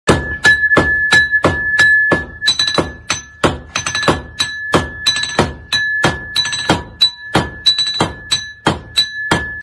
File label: copper hammer; چکش مسگری